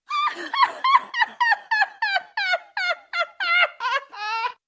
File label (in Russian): zvuki zhenskogo smekha